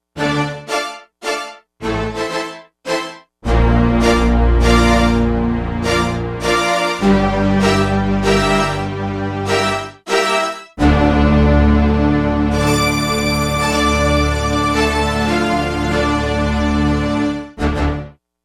Fast Octave; Digital Synthesizer

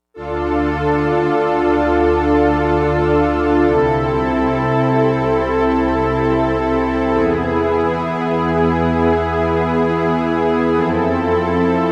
JP-8 Strings

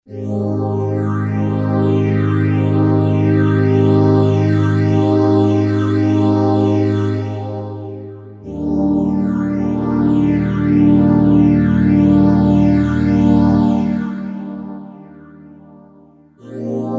Slow Phase Pad; Roland SC-880; Factory Patches

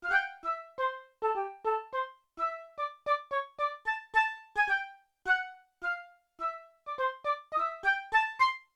Short Flute&Piccolo; Yamaha TX16W

